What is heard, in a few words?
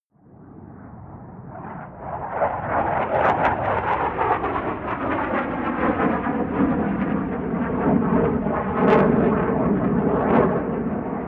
air strike